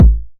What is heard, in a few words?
909 BD; Goldbaby Tape808 & Tape909; The Tape 909